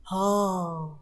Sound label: Haaa